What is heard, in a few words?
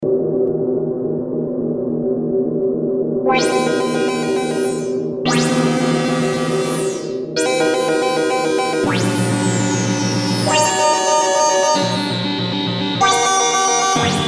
fx horror, minimax; Creamware minimax